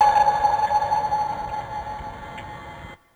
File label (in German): Echolot